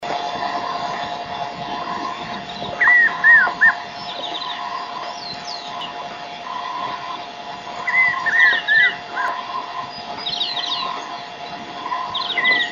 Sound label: Durmilí, Spot-backed Puffbird, Nystalus maculatus; cantos; PICIFORMES; Bucconidae